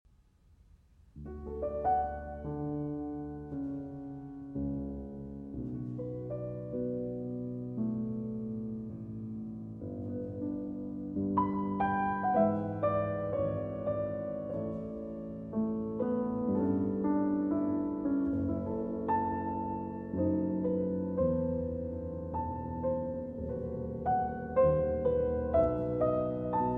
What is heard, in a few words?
Orchestral Suite in D Major; Air on the G String; No. 3; BMV 1068